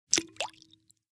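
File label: Splash Small Plop Glass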